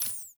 Pistol Laser Aim Exit; Warframe; Weapons; Tenno; Lasrian

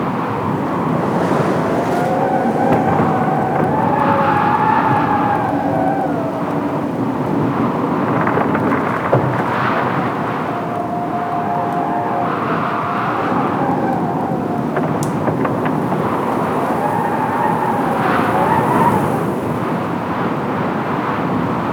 Ice Exterior Amb; Warframe; Corpus

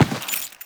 Step Default, Footsteps; Warframe; Teshin

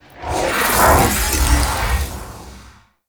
Teleport Beam; Warframe; Cephalon Weave

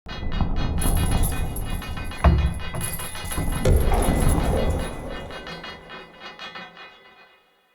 Castle Misc Metal; Ambience; Midrath Island

